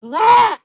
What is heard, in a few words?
goat attack; ZEUS